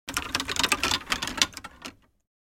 Shaking of tour bag golf clubs movement

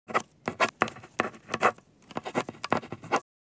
Ballpoint pen writing on paper

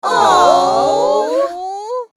People cute aww crowd reaction

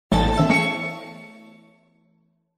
Epic orchestral level up

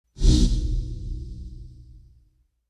Ghost passing horror accent

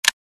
Sniper trigger click

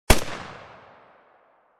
Semi automatic sniper rifle single shot

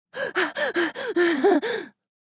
Woman moaning in pleasure

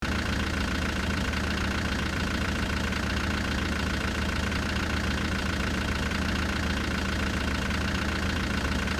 Old car engine idling seamlessly looping

